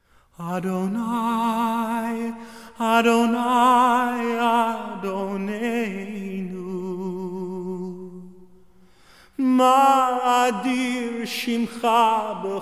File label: hebrew chant